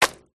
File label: clothing item wet throw hit glass window